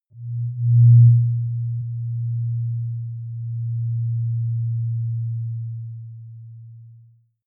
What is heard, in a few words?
simple moving sine short; toene